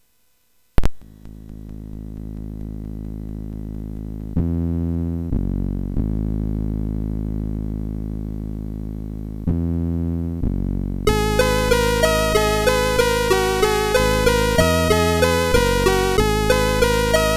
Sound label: Static Remix, MOS6581R4; hvsc